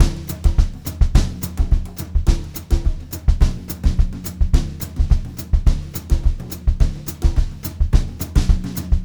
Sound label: HOTROD VARI, Brushed Drum Loops; USB Soundscan vol.39; AKAI; 90 s Sample CDs